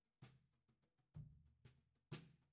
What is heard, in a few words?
Tom